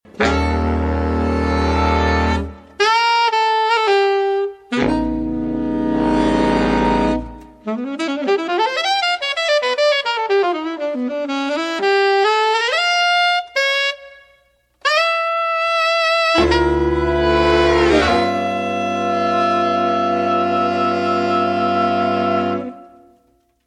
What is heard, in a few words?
Sax; cascade X-15